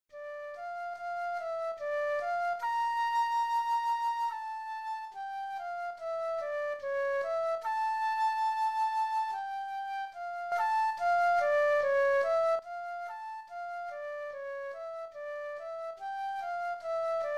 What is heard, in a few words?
MS Flute C slow, etudes; FLUTE Lyrical; Region 23 Middle School Etudes for 2026-27 (Year C)